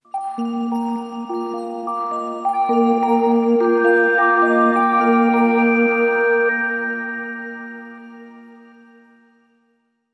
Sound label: Hymns Sting